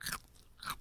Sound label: chomp crunch; farming; dfcaverns